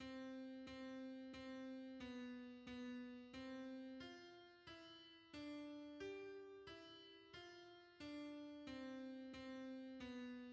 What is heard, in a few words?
hymns, alto; To wait for that appointed day